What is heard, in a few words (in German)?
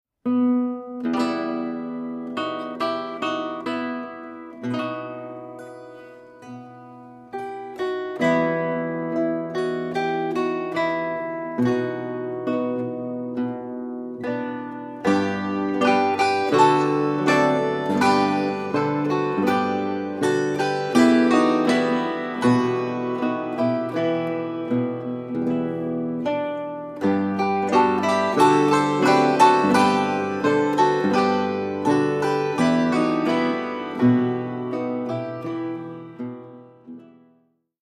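Spielmusik Religiöse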